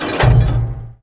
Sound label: Rearm; Big bang